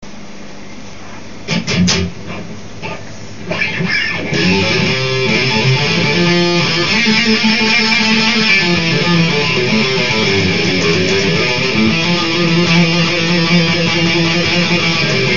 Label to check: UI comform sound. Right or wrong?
Wrong